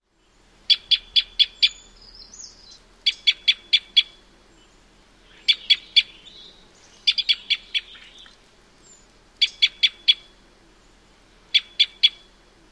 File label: calls